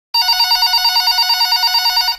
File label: office phone